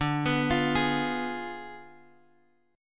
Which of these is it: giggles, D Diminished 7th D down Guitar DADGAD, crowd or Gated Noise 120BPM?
D Diminished 7th D down Guitar DADGAD